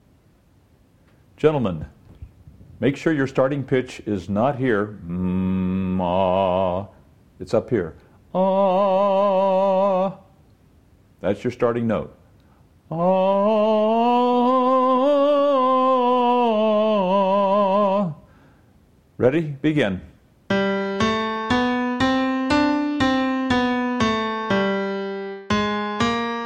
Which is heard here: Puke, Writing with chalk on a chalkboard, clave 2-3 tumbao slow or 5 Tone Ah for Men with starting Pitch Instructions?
5 Tone Ah for Men with starting Pitch Instructions